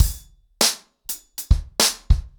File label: 100BPM, ACOUSTIC DRUMS